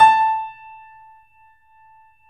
Small Pianos, 3-D Audio Collection

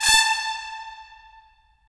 BRS, Tps Stacc; Roland - LA Composer vol. 4

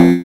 SYN, DANCE SYNTH

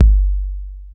BD, BD Dance; Sound & Vision; Gigapack I CD 1

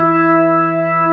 B3 E 3; Best Service; Advanced Media Trax Modern Composer; B3 SMALL2

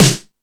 Rock N Roll, Drum Machines

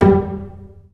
SECT PIZZ G2, Strings

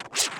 SCRAT; Masterbits - Total Zone

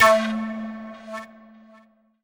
ethno, ethnic; Techno Trance Essentials

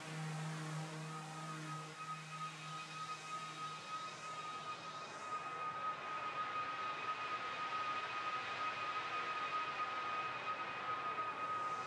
air pad, Smooth RnB, Ebmin; Big Fish Audio; Legendary; Happiness

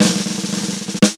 ROLL SD, snare; KICK + SNARE; Best Service; Hyper Dance

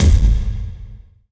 hit, IMPACTS & HITS; Techno Trance Essentials

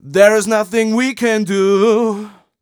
m 127 mel a, Vocals

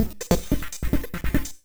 Breaks; neuro; Sinistarr

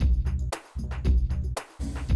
Classic Drum Loops

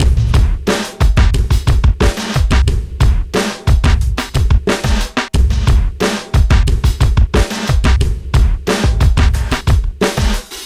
punch up 090bpm; Zero-G; Downbeat Dance Grooves

